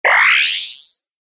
Generic Bonus Or Pickup, video game

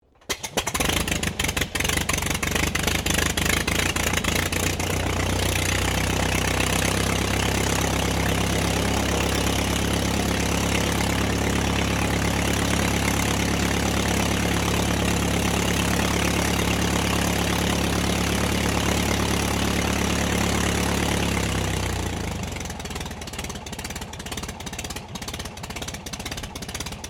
AIRCRAFT DH82A DEHAVILAND TIGERMOTH STARTUP TAXI; RAAF Tigermoth; Airplanes; Vehicles

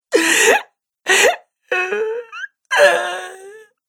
Woman Crying Sobbing; Crying Sound Effects

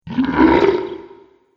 Dragon Warning Sound, monster; horror

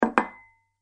Dessert Bowl Placed On Wooden Table; Dishware Sound Effects; Household Sound Effect; Andrew Fleming

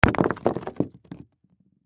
Crash Rock Patio Block Hit Impact Break Debris; Rocks; Blastwave

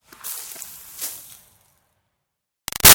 Snow Dust Drop Light; Weather; Big Room Sound

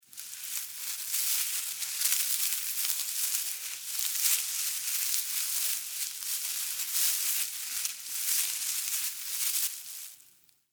Animal Critter Movement Grass Foliage; SFX Bible 6

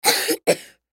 Human Cough; Cough Male Seven Yrs Boy; Human Cough Male Seven Year Old Boy; Sick; Sound Effects:Human

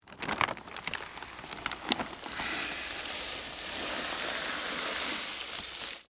Rock Bag Drag Rocks; Materials; BlastWave

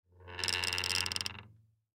Wood Gate Close, Wood Gate Close Creak Low Short; Gates; Doors; Foley; Blastwave

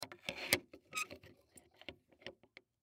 Pad Lock 60mm, Pad Lock 60 mm Place On Latch; Locks; Foley; Objects; Blastwave

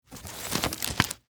Household Umbrella Close; Umbrellas (Sound Effects:Objects)

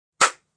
Clipboard snapping shut; Clipboard Sound Effects; Offices

